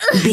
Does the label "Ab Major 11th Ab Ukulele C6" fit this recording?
No